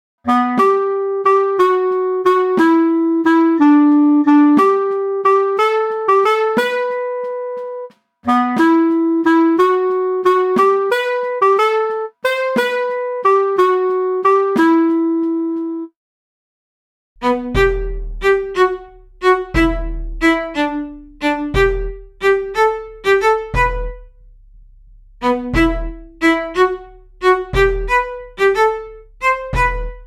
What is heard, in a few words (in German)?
midi, 1-stimmig; die nixe